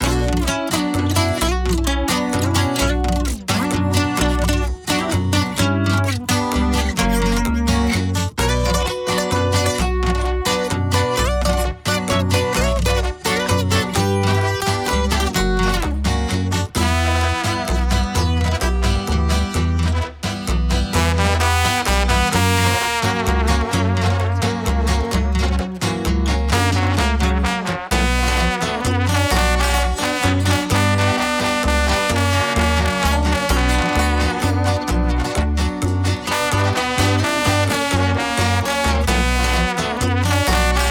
Corridos; corridos tumbados

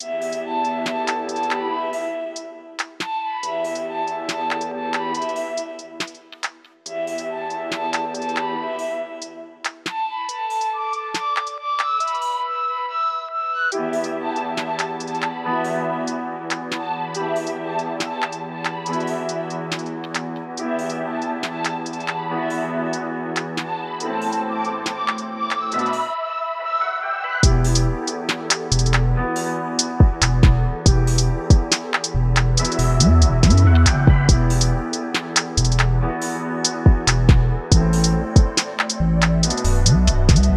uk drill beats

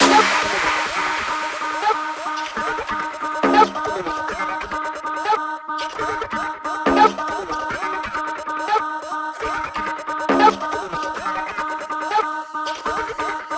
UK Bassline; UK dance music; heavy bass

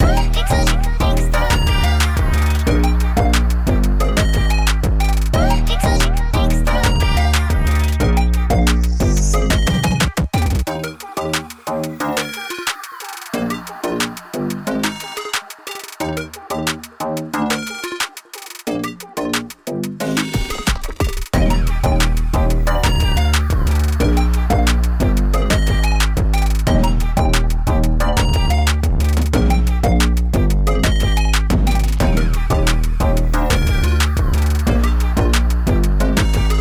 Digicore; hyperpop subgenre

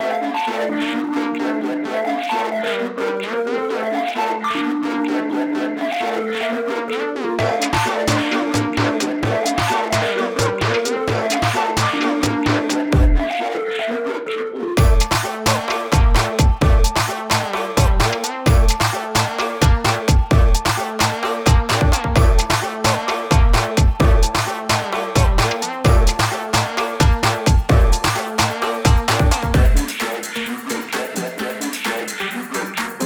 Brazilian Phonk

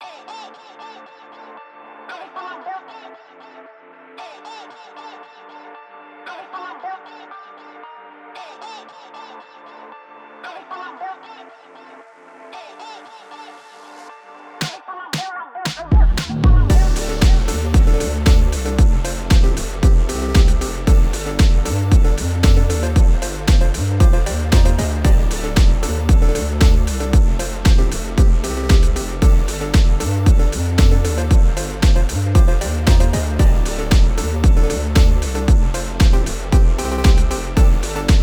Drift Phonk

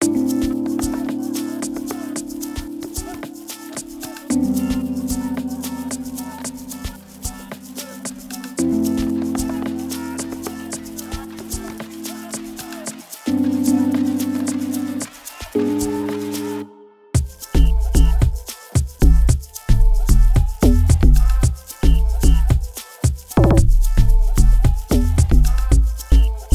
Amapiano

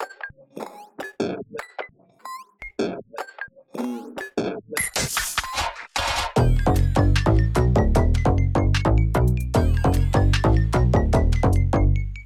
Coins, chiptune beats

